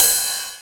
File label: 909 Ride; Novation Drumstation